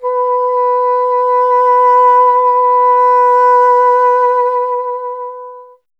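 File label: wind bassoon B; Miroslav Vitous; Solo Instruments VOL-1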